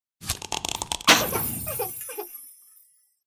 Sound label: valentine pet buff; pet drakonchik feer